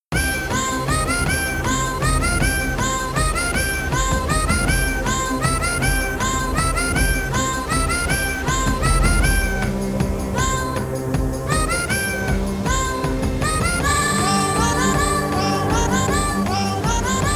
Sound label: ringtone; game of cats